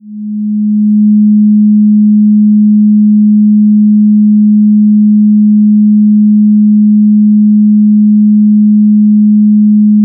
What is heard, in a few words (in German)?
Planetenton Mond synodisch